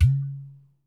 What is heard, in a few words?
Liquid Ibo - C#; percussion; Cymatics - Infinity Beta Pack 2.0; Zeus Percussion Collection